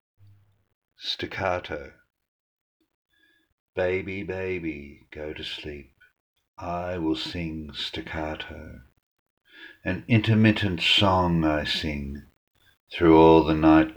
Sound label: staccato